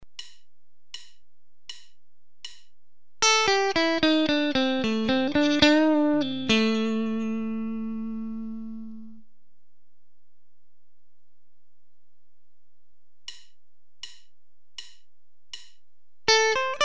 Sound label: Box I, Box II, and Box IV blues licks, guitar